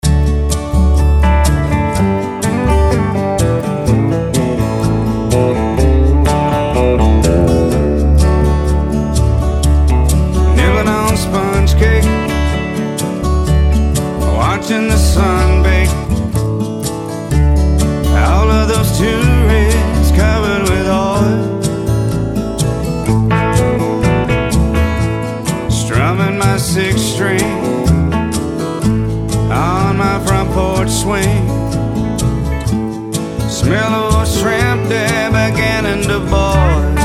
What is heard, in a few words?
SOLO EL GUIT